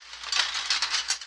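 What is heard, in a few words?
Armor Movement Clanks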